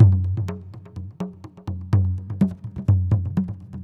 PERCLOOP ETHNO 125; eJay

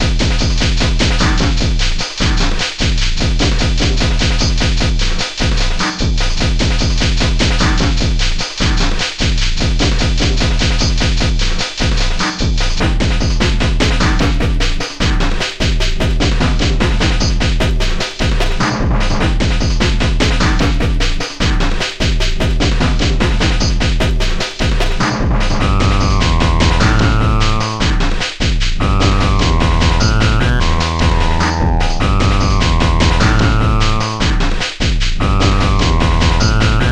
ambient jungle

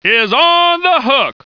HOOK

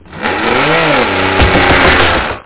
wrooom, whoosh; da capo vol1

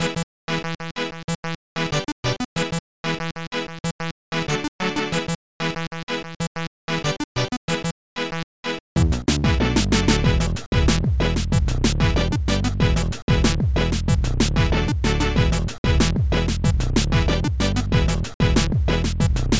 bleep; Digital Symphony Collection

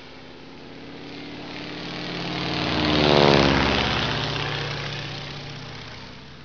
biplane; Wayzata Technology

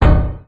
equip error; anito

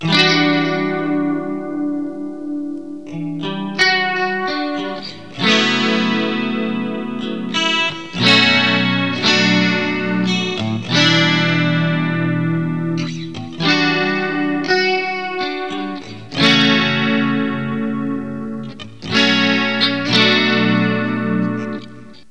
Guit; GUITAR; 88 BPM

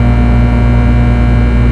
BUZZ; SiN; environment; amb machine

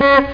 bike horn

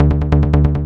Bass; Techno Music Maker

